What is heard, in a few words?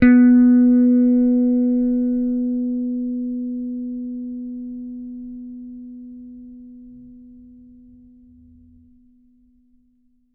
T-BIRD BASS PICK B3; Bass Mace; DirectWave